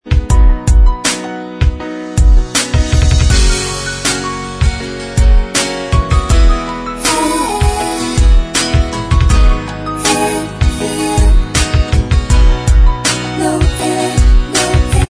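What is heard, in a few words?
backing vocals, backing tracks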